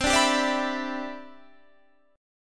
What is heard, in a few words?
G 6th C